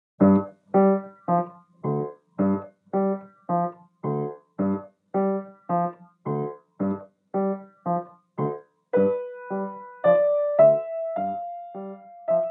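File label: Happy clowns, Piano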